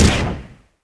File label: fire gecko flame end; npc